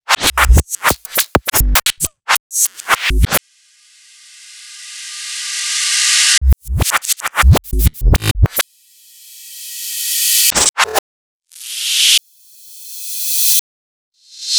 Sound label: Reverse